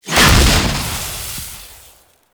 Death Burst; Warframe; Kuva Larvling

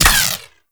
Ghost Expel Spirit Impact; Warframe; PriestQuest; RellGhost

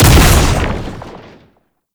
Lunge Impact; Warframe; Oraxia

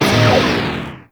Proj Large Fire; Warframe; Lizzie

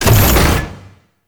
Standard Door Open Mech; Ambience; Gameplay; Warframe; Entrati

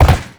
Land Metal; Movement; Warframe; Uriel

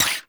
Pistol Mech; Operators; Drifter

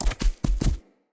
Stag Gallop Staggered Run Vegetation, Footsteps; Animals; Foley

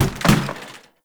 Janitor Cart Impact Short; Warframe; Ambience; NineteenNinetyNine

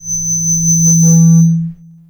Mall Stage Feedback; Hub; Warframe; Ambience; Nineteen Ninety Nine

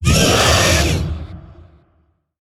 Vocal Pain; Warframe; Zariman; Void Angel

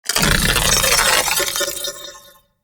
Reanimate; The New War; Sentients

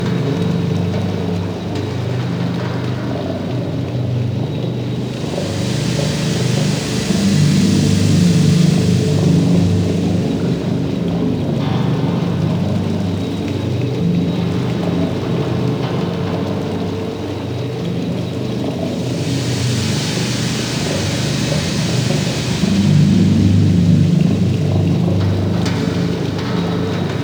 Ocean Interior Ambience; Warframe; Grineer Ocean

